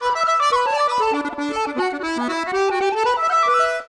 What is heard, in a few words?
win symbol; Clover Rollover 2